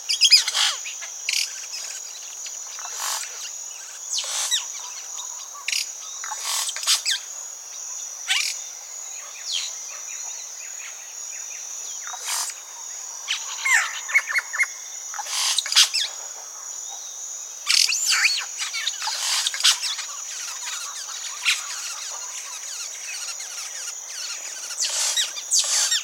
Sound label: oropendolas nesting colony